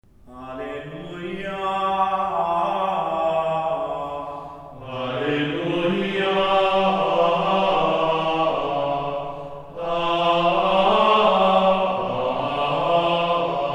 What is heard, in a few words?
alleluia dies sanctificatus